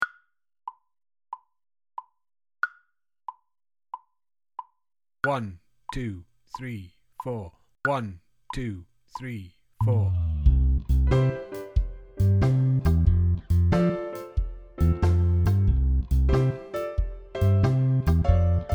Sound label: Full Mix, drum kit; Jammy Doughnuts